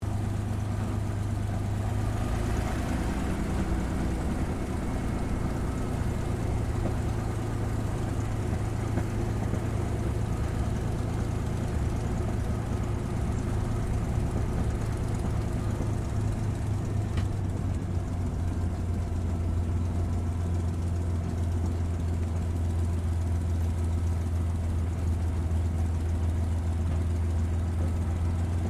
AIRCRAFT CAC CA-18 MUSTANG MK23 TAXI INTERIOR, WW2 Mustang fighter plane; Airplanes